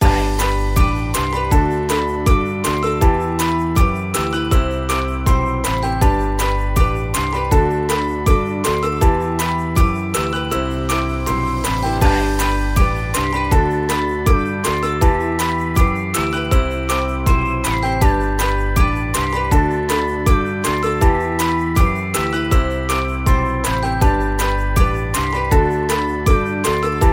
Ukulele Fun; MIUI12

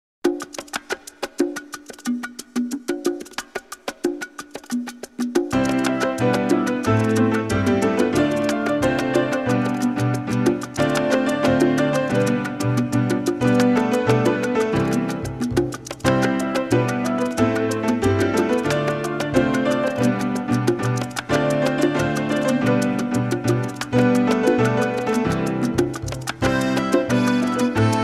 Latin Party

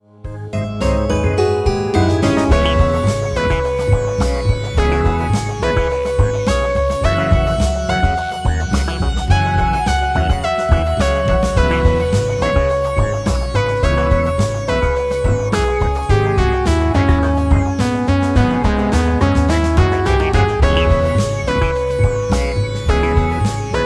Flash jump rhythm; MIUI12

